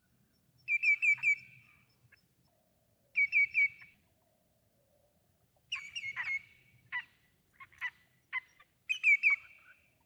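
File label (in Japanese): aoashishigi; birds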